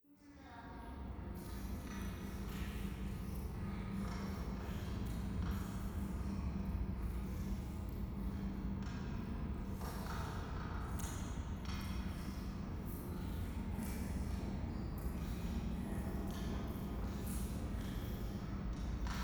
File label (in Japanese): 廃墟 フェラチオ 電車